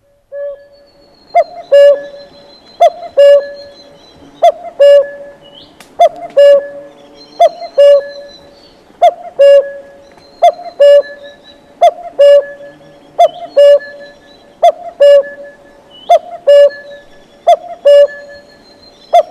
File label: common cuckoo